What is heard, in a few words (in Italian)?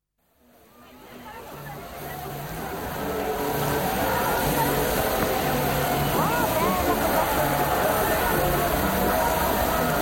BANDA MUSICALE FOLLA